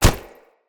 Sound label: Unarmed Punch Impact Flesh Armour Hollow; Weapon Impacts; Player; Combat